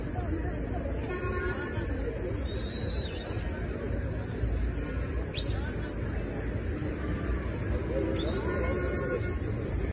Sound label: The Market